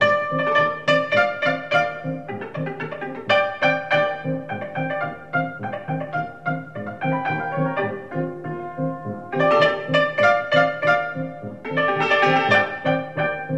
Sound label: polka, cymbalistes